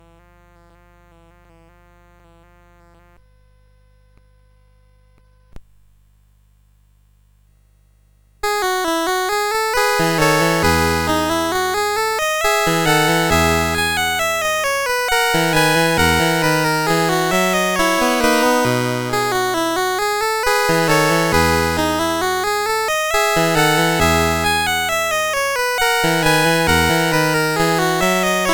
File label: Minuet, CSG8580R5; Joseph A Grau; Sixth French Suite